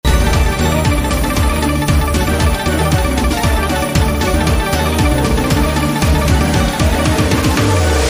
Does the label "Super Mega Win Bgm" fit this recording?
Yes